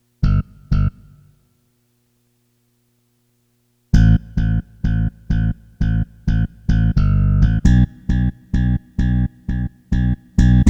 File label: bass